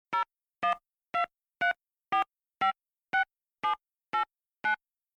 pushphone button; telephon

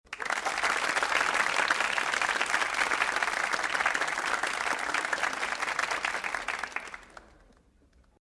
audience clapping

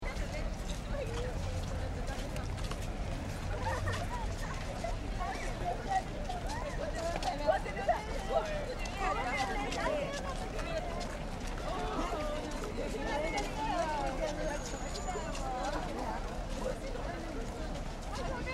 city omotesando

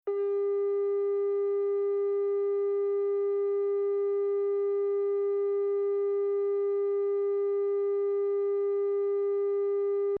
telephone

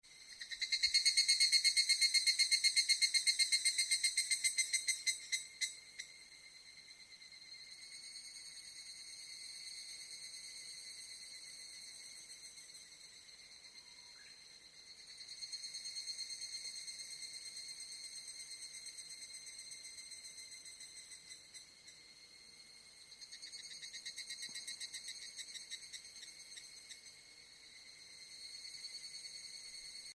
cicada

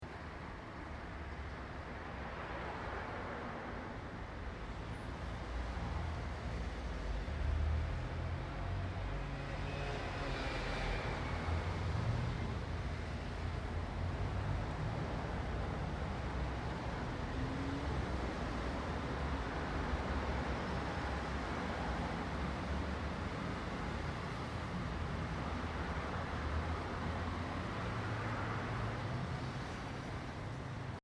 city route, ambience

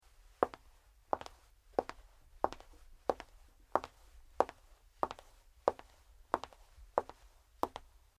footsteps woman; human

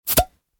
beer, sizzle

